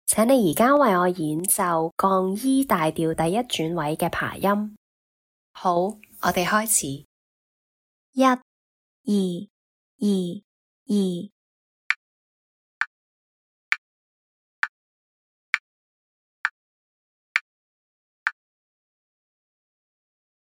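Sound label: E flat major arpeggio first inversion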